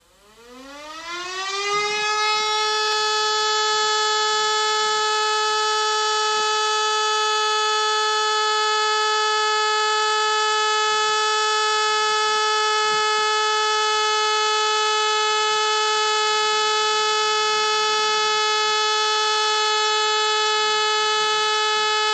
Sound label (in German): Entwarnung